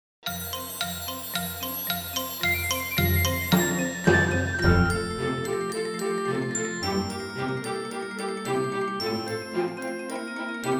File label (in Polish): ćwiczenk F dur; porenkowi czwiczenk; piosenki dla dzieci